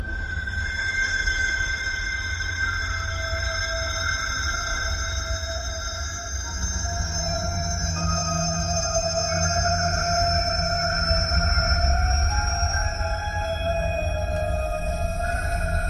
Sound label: music haunting shadows; halloween